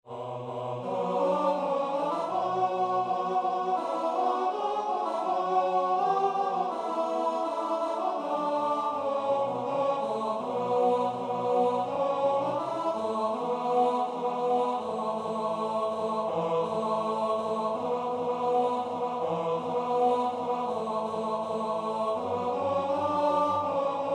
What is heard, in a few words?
tenor